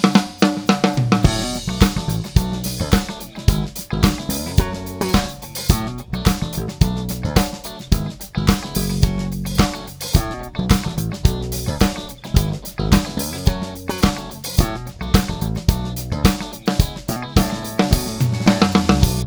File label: Slap S2 neck mix